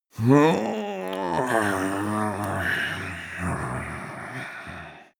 male growl tired; menschen; koerpergeraeusche; soundpool

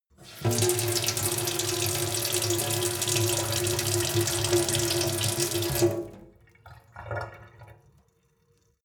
sink water flow medium

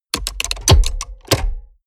mechanics large movement; industrie-wirtschaft; mechanik

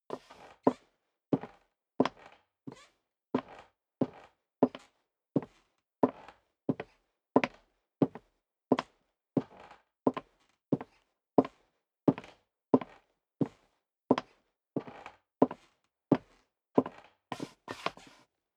step leather shoes wood creak walk sequence

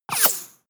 bullet large whoosh miss; krieg-waffen; geschoss

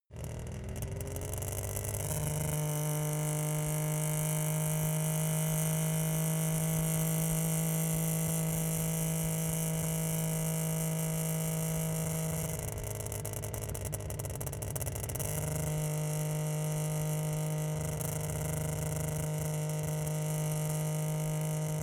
car saab 92 drive low exhaust

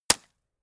gun shot silencer close; schusswaffen

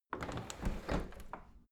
window handle close tilt; objekte; fenster

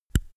microphone pop off; objekte

